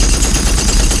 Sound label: machinegun loop; weapons; diprip